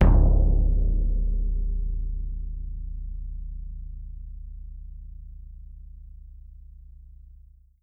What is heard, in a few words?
BD, Percussion; Miroslav Vitous - Percussion & Harp